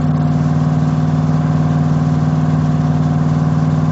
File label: sound car; Wheel Race